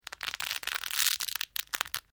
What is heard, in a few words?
Dry Brittle Snapping Long; Various Organic Foley sounds